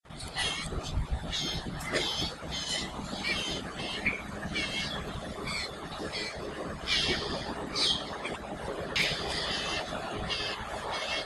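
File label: Common Iora juvenile call; Amar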